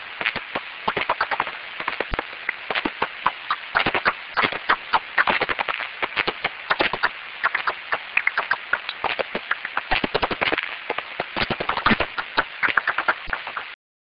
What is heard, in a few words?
pipipi; cris d'écholocation d'une pipistrelle commune; Pettersson D200 réglé sur 49 kHz, très précisément le battement; Hamois, Belgique